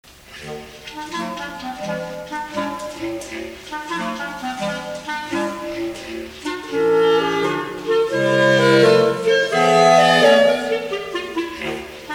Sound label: Funeral March; Funeral March of a Marionette; clarinet 4tet arrangements